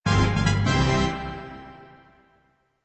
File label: LVLUP